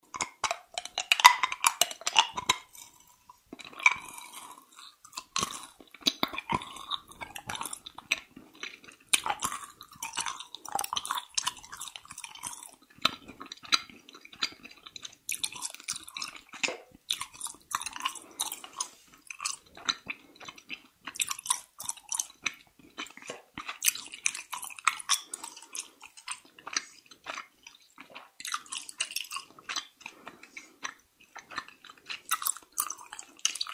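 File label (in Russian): razjevyvanie jvachki, chewing gum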